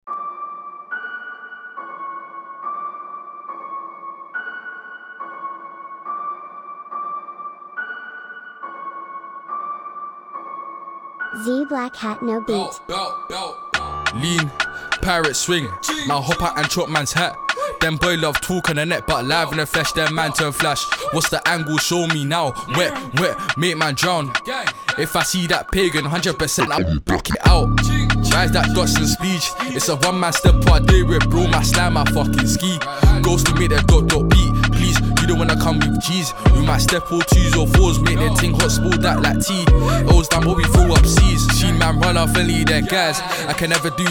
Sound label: drill